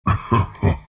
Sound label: treant laugh; dota